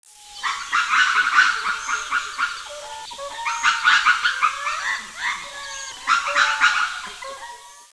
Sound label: lemur sounds